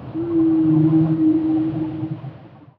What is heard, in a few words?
cetaceans; ambient soundscapes; dota